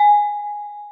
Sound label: celesta, programmable speaker